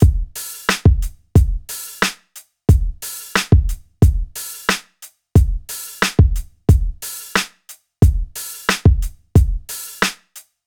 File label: 90s hiphop loop 90; Reference beat bpm90